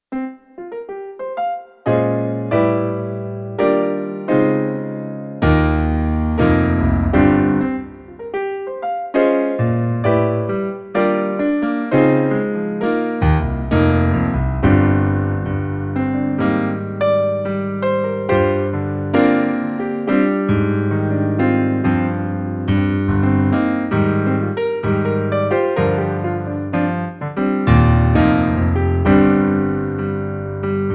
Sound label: blues riff